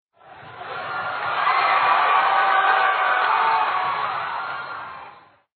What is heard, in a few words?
medium cheer; Sports Games; BaseballClassic